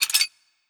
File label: Metal